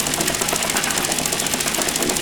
Slotmachine 2 Slots Rolling

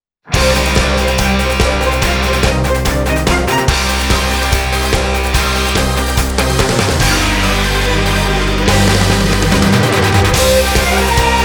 Battle BGM

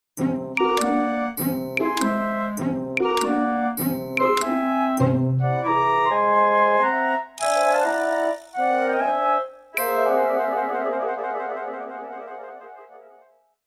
Failure

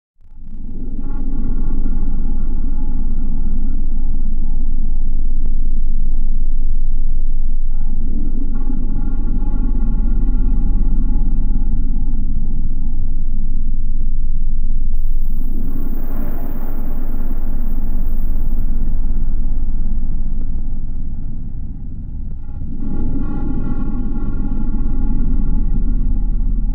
Gate B Ambience; Containment Breach

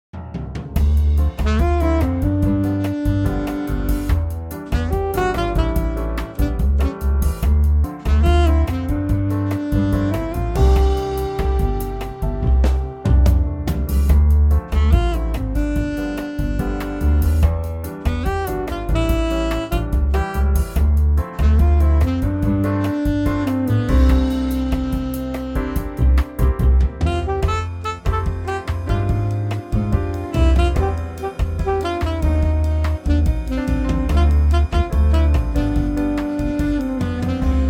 Bossa

